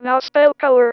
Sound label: Female vocals and robo-vox